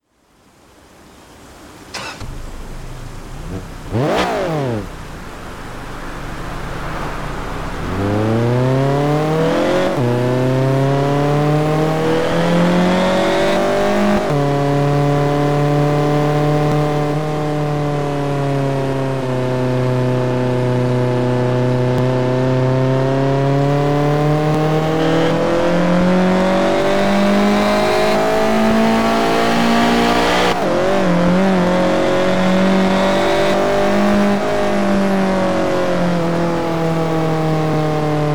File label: ariel atom supercharged; TDU-1